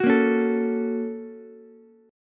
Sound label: Bb Minor Major 7th Sharp 5th Bb down Ukulele; BbmM7#5; strummed; JGuitar